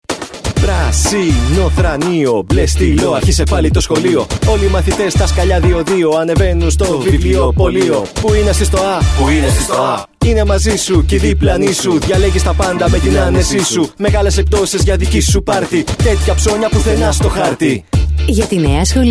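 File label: Greek, song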